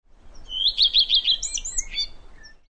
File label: redstart